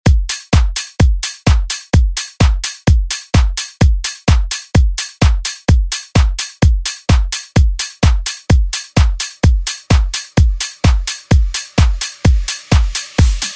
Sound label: Tech House; Deejay Hit